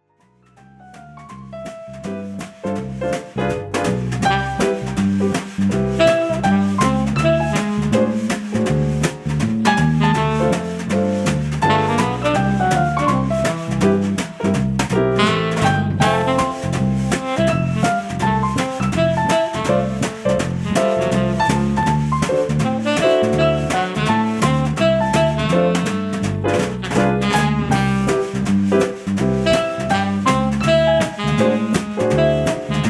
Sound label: Sax